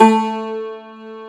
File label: Cumbus Saz A2